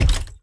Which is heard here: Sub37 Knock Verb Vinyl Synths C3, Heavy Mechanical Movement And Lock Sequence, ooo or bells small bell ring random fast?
Heavy Mechanical Movement And Lock Sequence